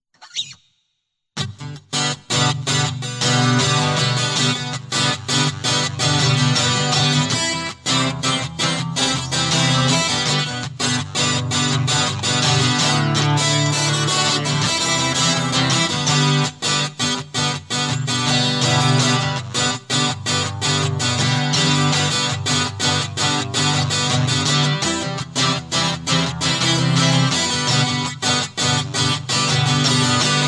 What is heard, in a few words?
Ska Blues